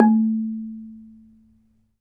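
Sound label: ranat thum A3; RANAT THUN; Spectrasonics; Heart of Asia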